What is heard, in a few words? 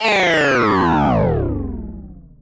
breaking, sentry